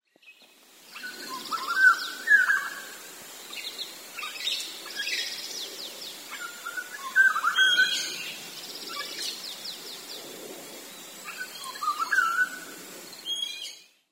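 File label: black-naped oriole, sakata; koe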